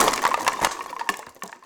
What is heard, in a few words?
plastic debris fall